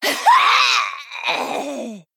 Female Gnoll Death

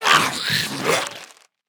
Curious Glimmerroot Attack

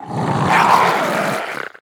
Felstalker Play

